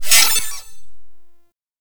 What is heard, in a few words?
Proto Infested Mutalist Pain; Warframe